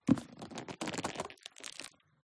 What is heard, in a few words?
Book, Handling; PMSFX